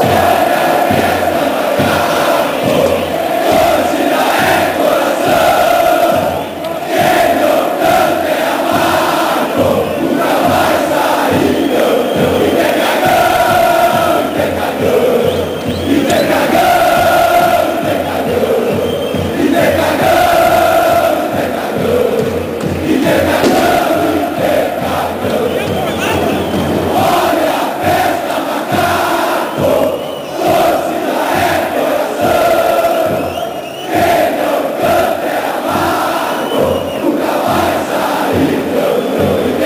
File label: interinternacional chickenshit, fanchants